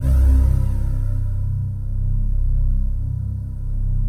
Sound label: PAD, ATMO PADS; Best Service; XX Large